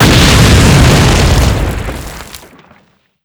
Explo mine; modern warfare 2

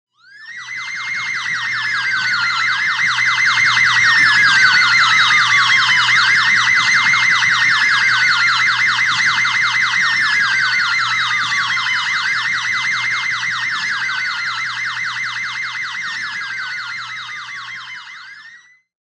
foreign siren; amb elements; modern warfare 2

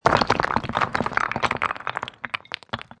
rubble; modern warfare 2; amb elements

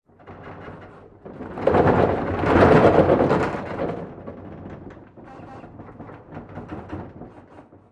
ac130 rattles; amb elements; modern warfare 2

